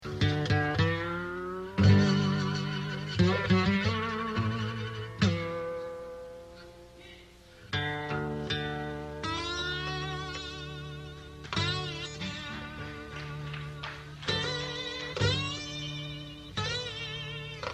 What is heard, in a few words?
Music breaks